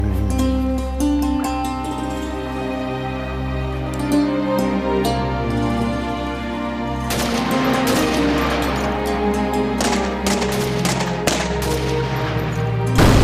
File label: Instrumental BGM Ringtone; Shikara